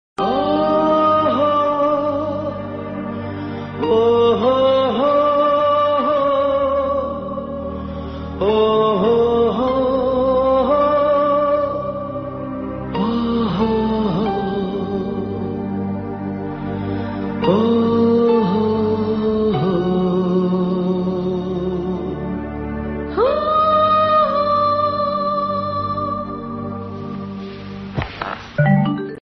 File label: Ringtone; Gadar